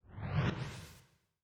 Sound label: Sci-fi Swoosh